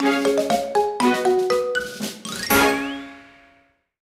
ITEM GET; Yo-Kai Watch; 3DS

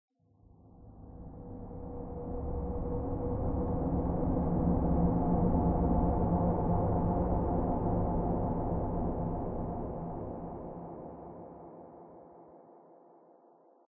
Diving Music Sweep Pad

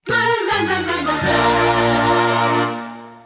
Goal Fanfare; crash bandicoot series sound box; Dead Heat

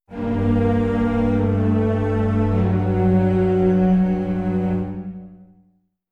Tension Strings; Mob of The Dead; Call of Duty Zombies; Treyarch 2008

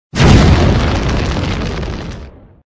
Fire; mega boulder rampage gamerip

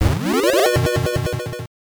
Coin; Brain System 2; arcade

